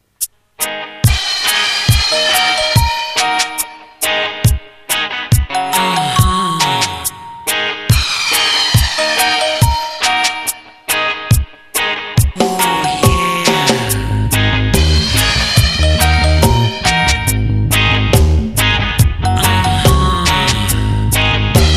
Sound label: reggae remix